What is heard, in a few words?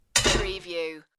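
Utensil Sink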